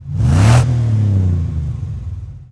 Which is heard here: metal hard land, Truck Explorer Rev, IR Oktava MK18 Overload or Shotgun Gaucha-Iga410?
Truck Explorer Rev